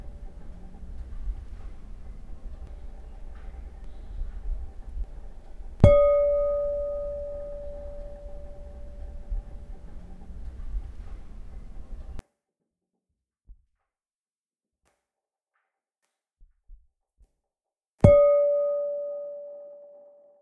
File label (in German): Japanschalen; ZOOM; Brusfri